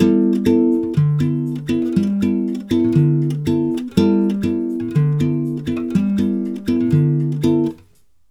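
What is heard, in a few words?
120 guitar G, brazil bossa; USB Soundscan; AKAI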